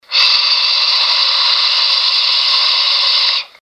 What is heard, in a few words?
Haaaaa